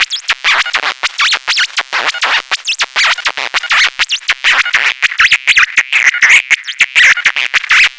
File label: Noise, LFO & Noise